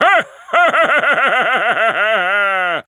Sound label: Wizard Laugh